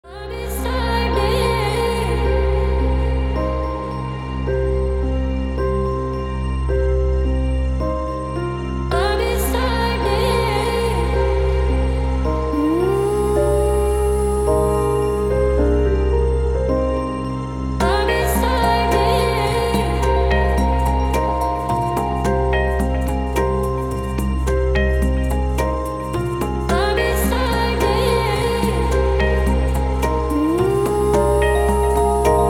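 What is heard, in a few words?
ringtone relaxing ambient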